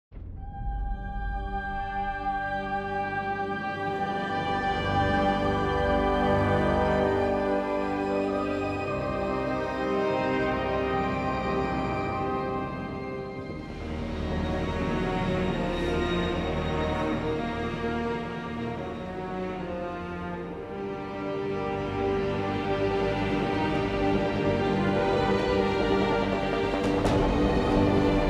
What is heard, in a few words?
trailer music; Galactic Civilizations IV Supernova